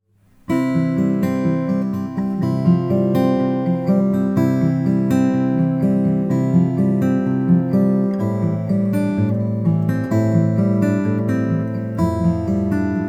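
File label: 000 12 brazilian rosewood; kim walker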